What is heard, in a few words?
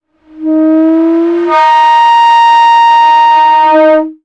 sound design effect air pipe pressure